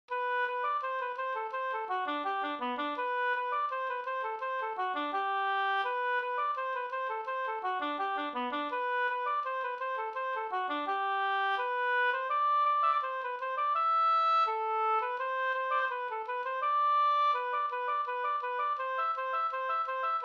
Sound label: polka